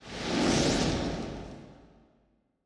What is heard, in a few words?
fiery missile; soundscrate